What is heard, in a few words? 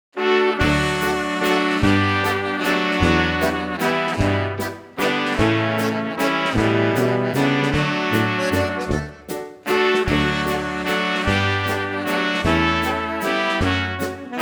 Foundling Waltz